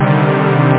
chord

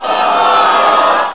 AHH

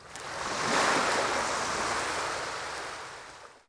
Q Wave, interface

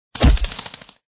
enemy bullet hit; Ataque de Esporos

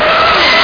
car skid

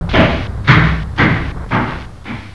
Down Stairs; Mythos

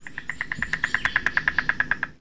cork pop; Atmosphere Lite

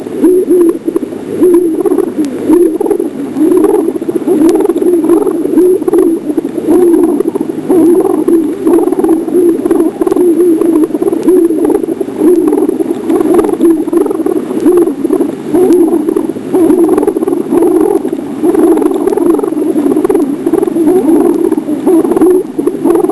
Pigeons

